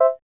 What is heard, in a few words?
Camera Popup; T390K Wine 3G; LG